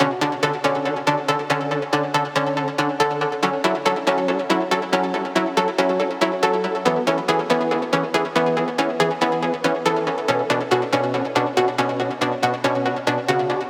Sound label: Root B 138 BPM; Vengeance Samples; Special Sounds